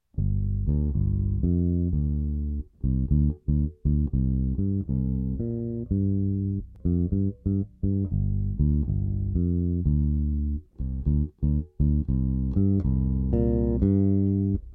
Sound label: Electric Bass Treble Tweak; Bass Tone